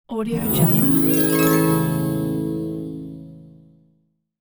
silver magic harp

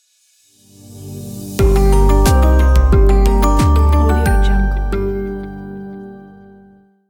mistery opener logo

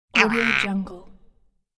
cute crocodile pain

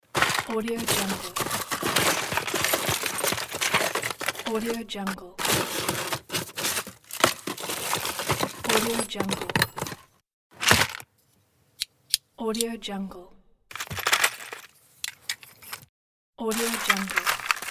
swarming in tool box; audiomechanica2

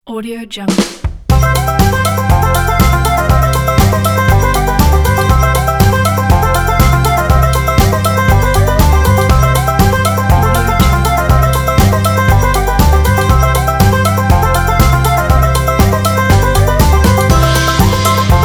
country music

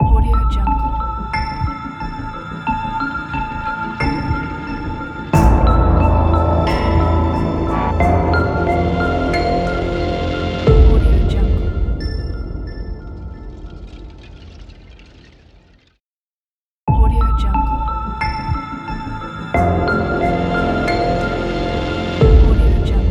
cinematic frost logo

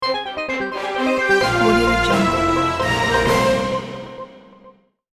breaking news logo

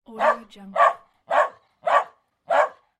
dog

